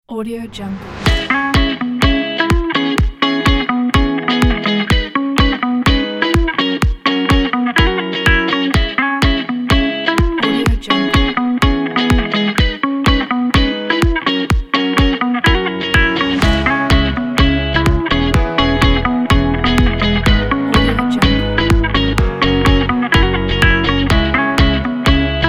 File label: summer pop